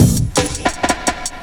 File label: Old School Cut, Jungle, Drum Loops 168.5; Zero-G Jungle Warfare 1, 2 & 3